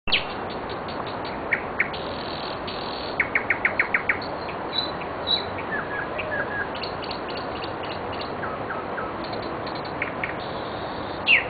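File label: solovii, nightingale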